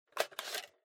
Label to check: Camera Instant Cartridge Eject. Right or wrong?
Right